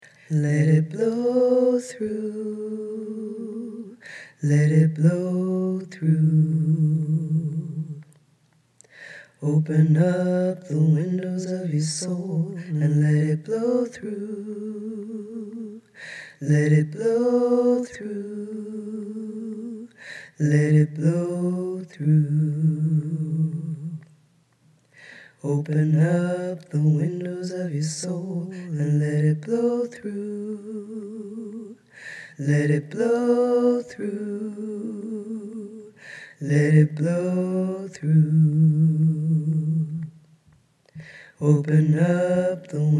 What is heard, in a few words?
TENOR